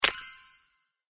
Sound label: add worms; FishingFrenzy